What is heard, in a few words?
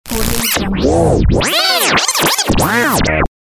radio imaging